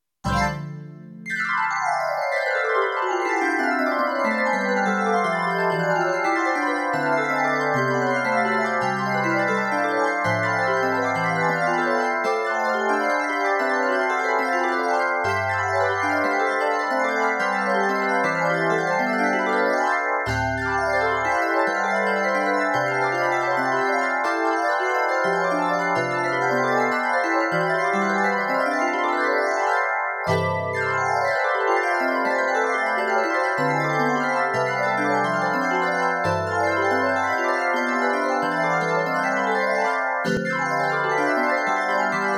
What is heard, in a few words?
Music Box